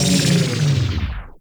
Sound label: Ring Rotate Large Slow Stop; Warframe; Orokin